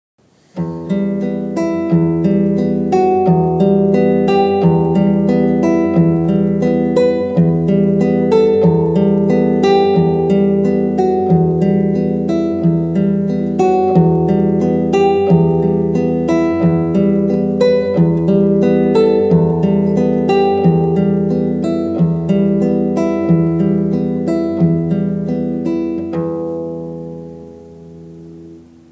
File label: ETUDE ARPEGGIO